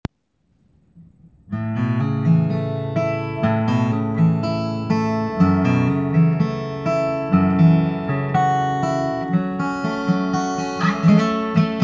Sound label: Acoustic Capo 2